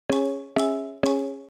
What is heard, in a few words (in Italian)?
Messaggio